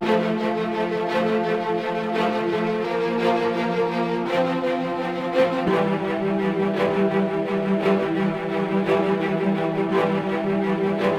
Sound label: Loop Nofade Nobase; King Lucas